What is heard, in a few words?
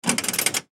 Soda Vending, Soda Vending Machine Antique Turn Handle Crank; Machinery; Industry; Blastwave